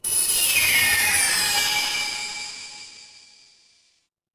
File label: magic scifi spell